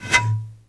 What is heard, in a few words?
Blow Bottle Mortar Shot; Weapons